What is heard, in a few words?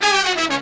horn section go down; Horns and Brass